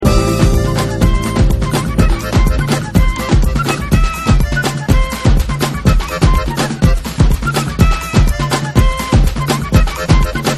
electronic superjazz

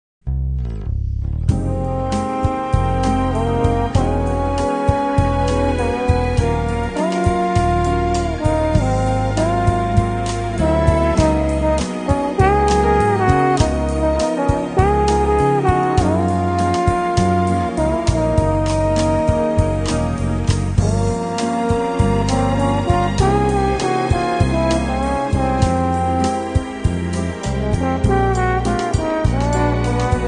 jazz brazilian nights; bossa nova

